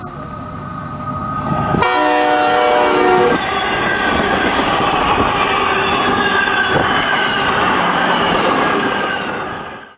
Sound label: Lagoon train